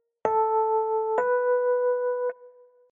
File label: Tone Up